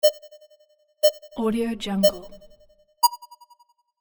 countdown beeps 321 go

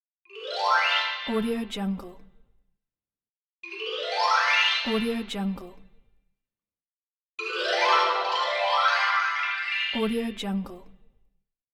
achievement

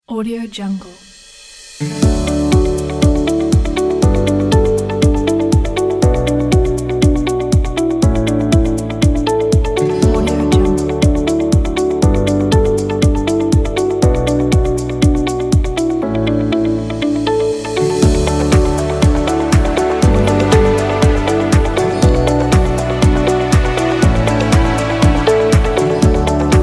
inspiration ambience corporate

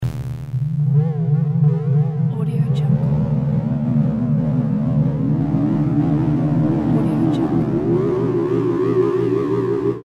scifi fluctuation

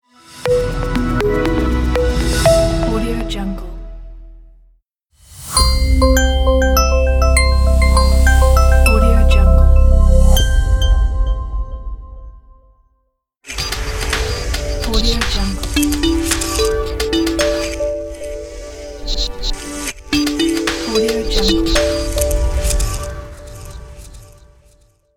soft logo pack

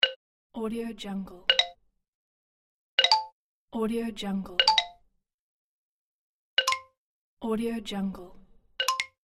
xylo interface sounds

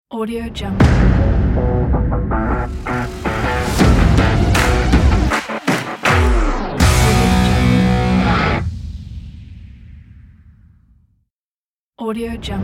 upbeat fun sports rock logo pack

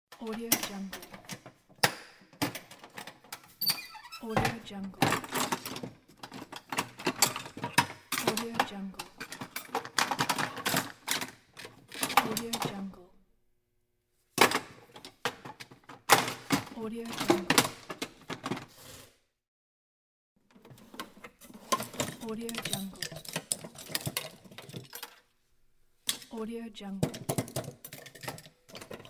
searching inside a toolbox

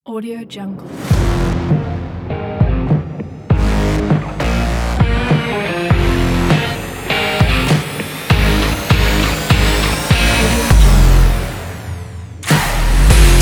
rock trailer

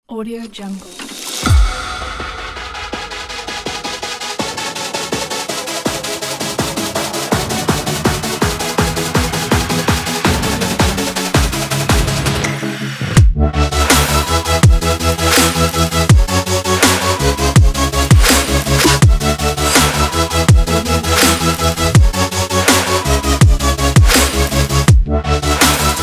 future pop

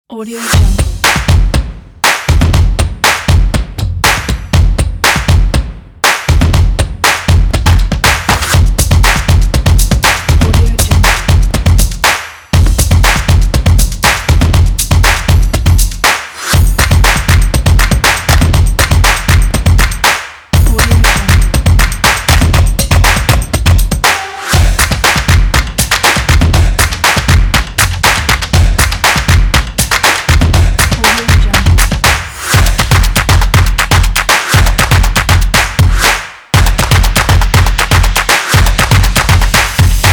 stomping; glowcity

